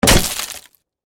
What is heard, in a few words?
Impact Door Kick Break Wood Heavy; Miscellaneous Doors